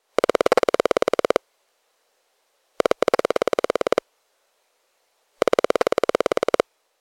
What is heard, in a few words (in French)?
WIFI iphone recherche reseau wifi; Ondes électromagnétiques et électromagnétisme